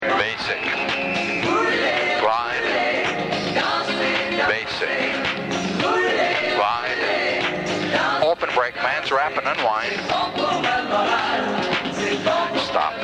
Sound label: Merengue Cued